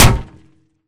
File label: Imp Bullet Light Ballistic Solid Metal; Titanfall 2